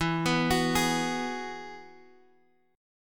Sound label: E Major Add 9th E, Guitar, DADGAD; chord shape